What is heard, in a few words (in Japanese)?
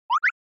ユーザ受信音